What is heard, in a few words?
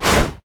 basicattack human; LoL; shyvana